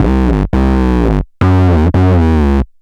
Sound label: Bass B dist 170 C, drum n bass 170bpm; musicradar; Basslines B